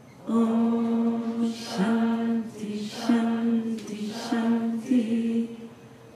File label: Om Shanti